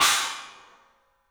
CYMBALS; 3D Sound; 3D Drums

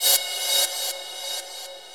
Echo Reverse Cymbal; Sonic Foundry (Sony Creative Software) - Futurist Drum & Bass; Drums & Percussion

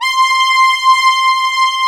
MOOG LEAD C4; USB Soundscan vol.49; Analog Fantasies; AKAI

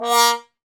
BRS BONEWA, Solo Tb mute; Roland L-CD702; Solo T.Bone

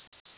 4 beat hihats; Urban Shakedown

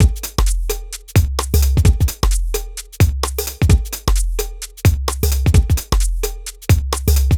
Full Loop 125, Loungin House; Equipped Music

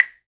V-Drum Claves, Percussion; Roland BK-5

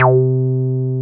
303 RES C4F, RES BASS

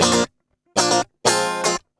GUI 120 5bA#; Best Service Gigapack 2

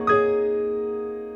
CHORD R, Drum & Bass 140 BPM; Ueberschall; Drum & Bass Flash Trackz

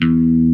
ELECT BASS, pick bass

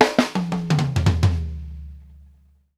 ROUND THE, Drumworks FILLS 84; Sampleheads; New York City Drumworks VOL-1; KD FILLS 84

